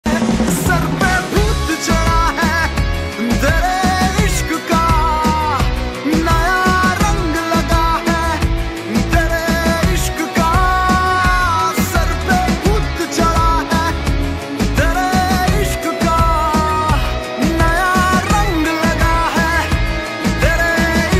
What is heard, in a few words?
hindi ringtone